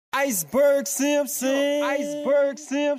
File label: Riff; RiffRaff